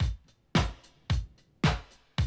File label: drum loop, 100 BPM